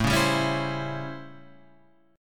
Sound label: A 7th Sharp 9th, Guitar, Open F; A7#9; strummed; JGuitar